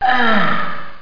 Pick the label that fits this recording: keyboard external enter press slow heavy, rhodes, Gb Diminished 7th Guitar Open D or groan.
groan